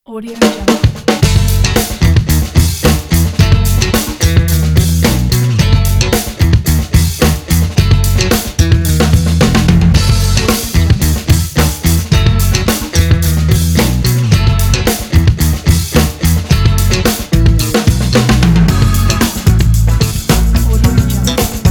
the funk

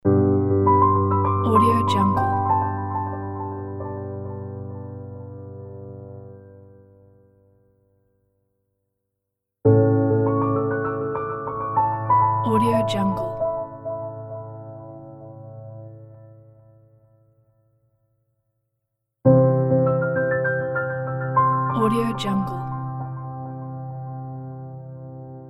uplifting merry logo pack; evanni

